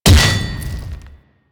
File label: Hammer Glass Impact Default B; Warframe; Weapons